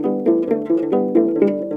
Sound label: HRP AF HRP, Afro Harp Phr; Roland LCDP11; Africa VOL-1; Afro Harps